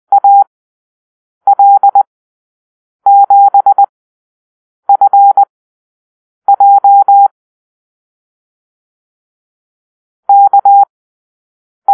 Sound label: Call-Sign Drill, morsecode